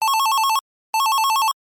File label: ring